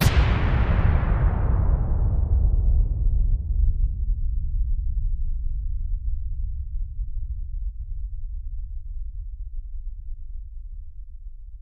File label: Impact Metallic Ech